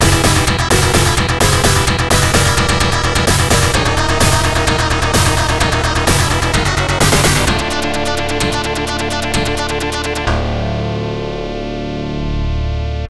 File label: Mission Failed; Desert Strike Return to the Gulf; Genesis